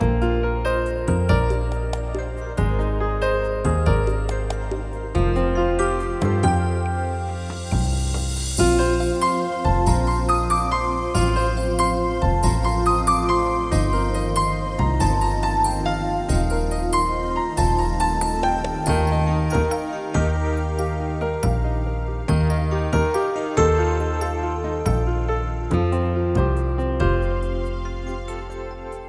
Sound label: shell mus